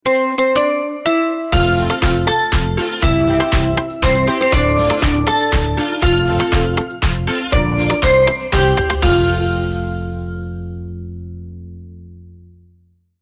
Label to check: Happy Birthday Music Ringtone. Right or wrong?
Right